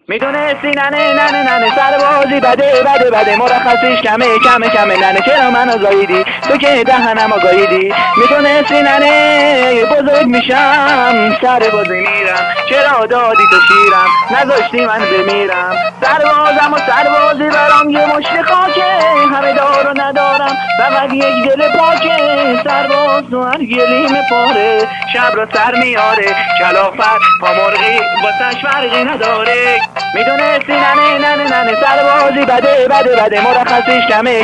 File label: arabic music low quality